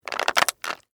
Crack Crunch Celery, Texture, Crack, Crunch, Celery; Twist, Stalk; Food